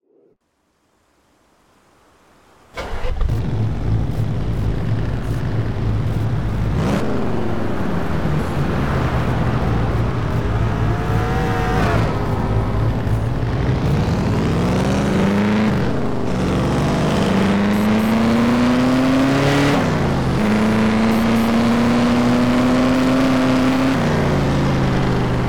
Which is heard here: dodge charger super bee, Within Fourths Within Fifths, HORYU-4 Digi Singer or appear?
dodge charger super bee